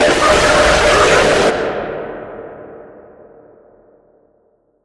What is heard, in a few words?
jumpscare; springtrap